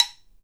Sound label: KALAAW, HAWAII PERC; AKAI S6000 CD-ROM; WorldSounds